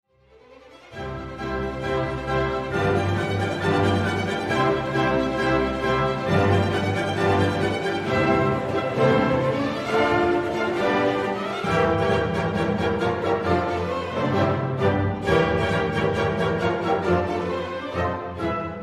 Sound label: Overture; Rosamunde